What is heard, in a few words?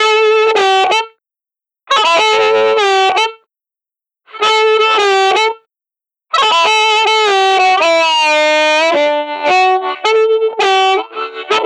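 Blues Harmonica; Digidesign; Pro Tools Essential Loops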